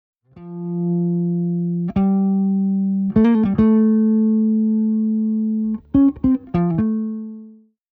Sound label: bass